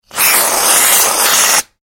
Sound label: food cream can aerosol spray, Aerosol Whipped Cream Can Spray; Shaking; Objects; McKinneySound